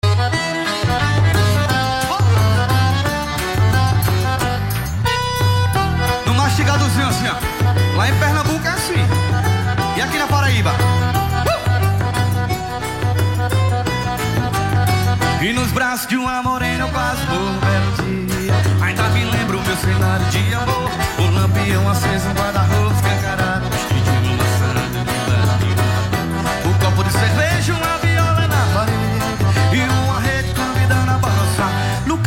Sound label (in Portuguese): forro pe de serra